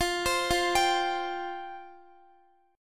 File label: F Suspended 2nd F Guitar; Fsus2; arpeggiated; x,x,17,17,17,15